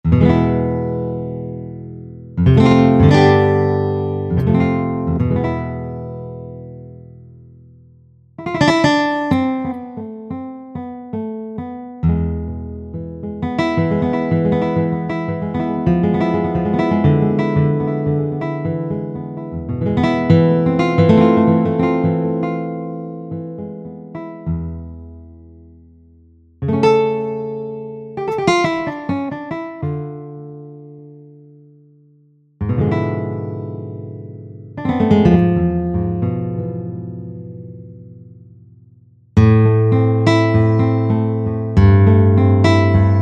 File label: Grusty, E-mu Proteus VX